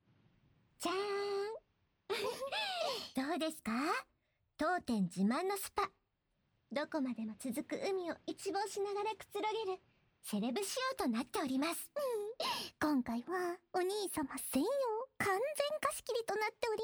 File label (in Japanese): 炭酸シャンプー; ２人で炭酸シャンプー; ASMR